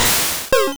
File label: Softboiled; sfx; attack moves; pokemon; gen 1; rby